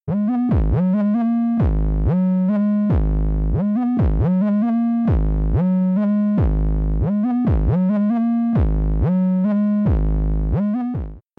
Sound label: intro music; freak fortress 2